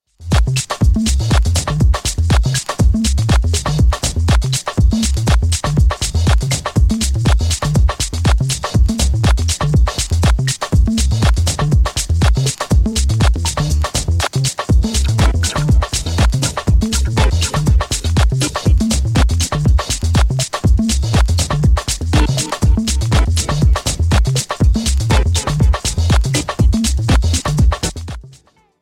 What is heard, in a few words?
percussion